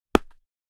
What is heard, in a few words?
tackle on the ground